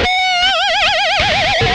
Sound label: whammy fx, guitar